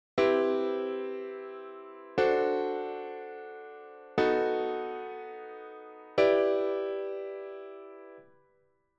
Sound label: strings